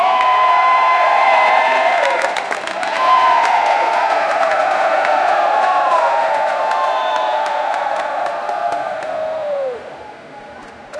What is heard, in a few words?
soccer fans cheering in a